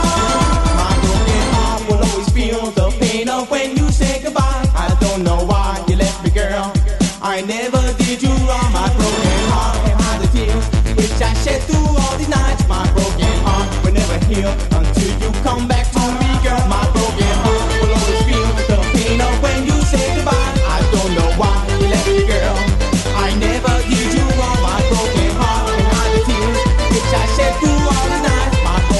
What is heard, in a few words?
Latin Freestyle